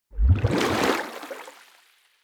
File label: submarines engines; World Of Warships